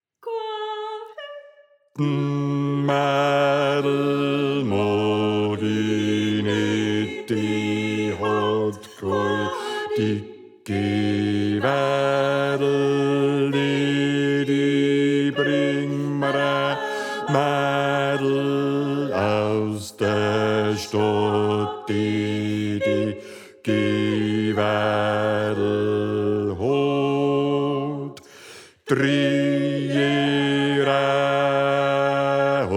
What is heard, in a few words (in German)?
Bass